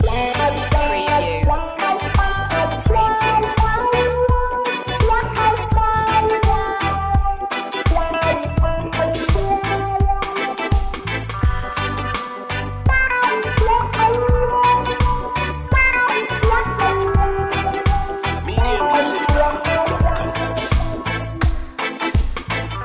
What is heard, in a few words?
sunny reggae loop